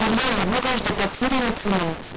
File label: noise